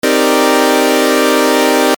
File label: C Min 9